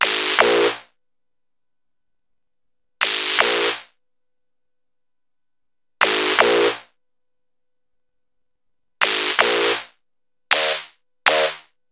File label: E-Coast HipHop Sizzle Bbmin, Synth; Digidesign; Pro Tools Essential Loops